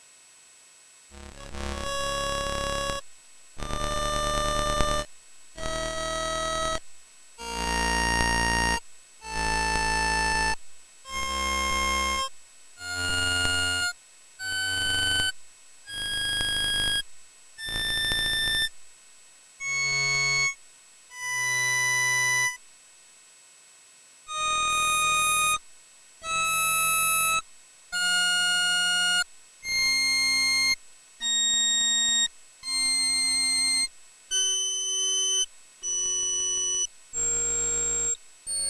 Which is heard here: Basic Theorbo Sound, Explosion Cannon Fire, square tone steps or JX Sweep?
square tone steps